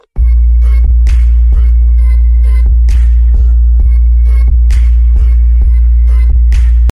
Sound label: Bass